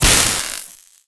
fx laser impact

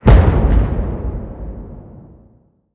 explosion grenade frag low

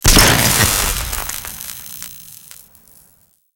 explosion grenade emp high

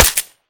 Pistol 10mm Fire Silenced; ARMES

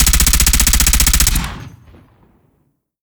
Rifle Laser Gatling Fire

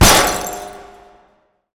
explosion mine bottlecap; lunchbox

